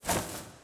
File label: Vase Angelwings Flap; golf with your friends